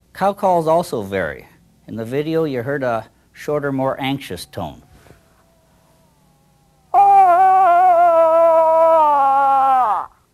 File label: cow call short anxious, moose